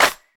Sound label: smooth clap sound c key, Royality free clap tuned to the C note. Loudest frequency: 2485Hz; single hits one shots